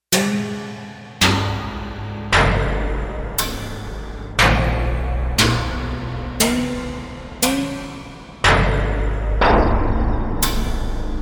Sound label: Big Room, synth